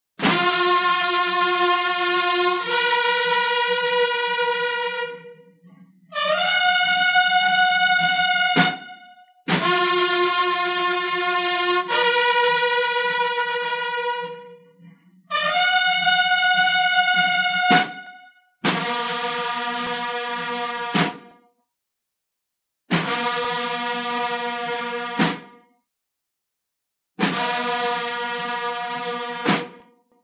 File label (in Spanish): Toque Silencio, TOQUES MILITARES; MATERIAL CÍVICO